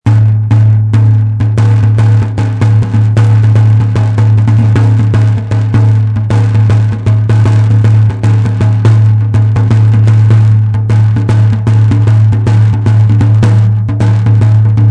tamboril

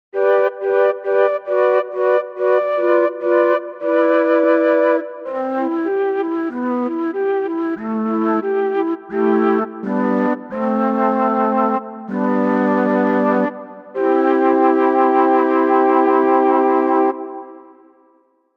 mellotron

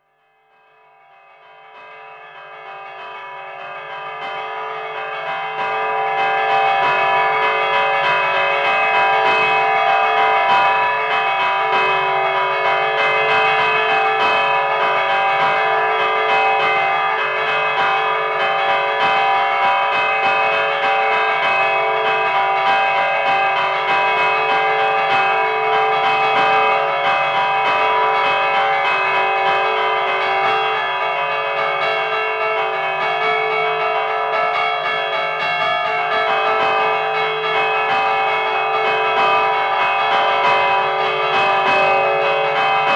repique, campanas

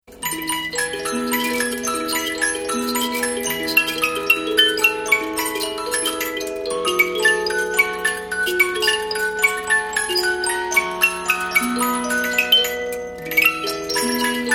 polyphon

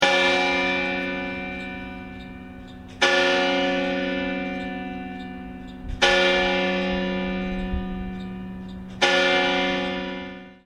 campanas; salamanca

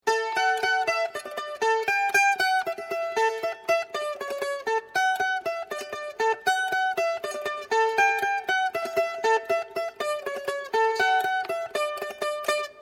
mandolina

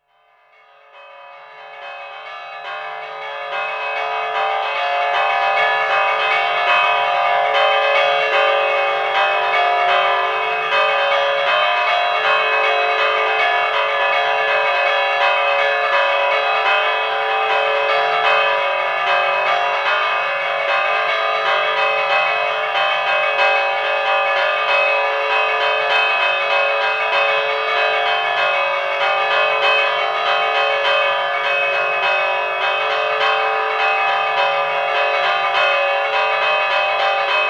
toque de fiesta, campanas

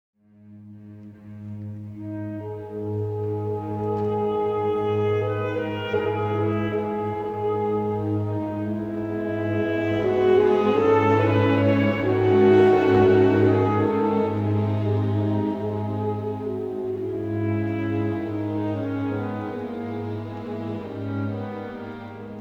saxo

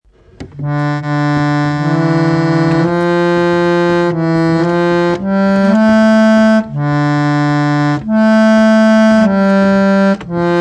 armonio

